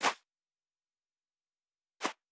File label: Footstep Sand Running